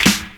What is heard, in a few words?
KIT, Dance Mega Drums, HIP; Best Service